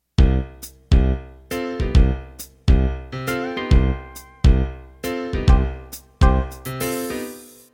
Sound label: Gospel Rock Intro